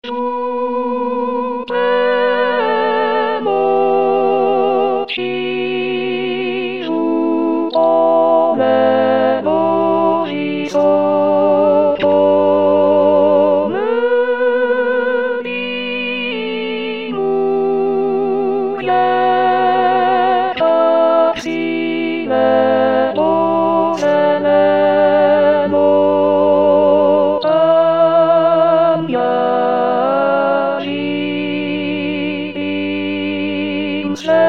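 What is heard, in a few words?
alto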